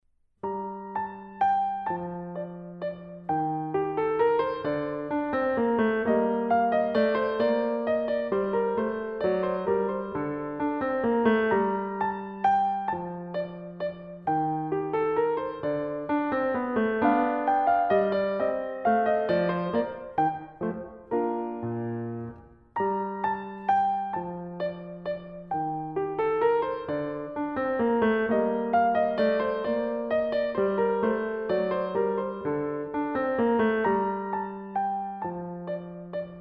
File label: Bach Menuet in G mineur